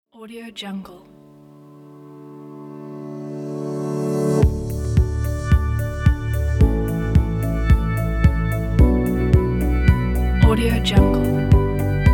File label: corporate ambient